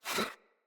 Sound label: Pet Alert Snort; Enemies; Catbrow; Pet Ship